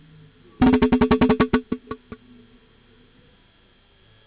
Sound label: drums